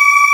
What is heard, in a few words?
Aahs F4